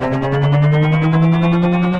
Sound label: Engine Failure, Effects; Ableton; Factory Packs; Synth Essentials; Collision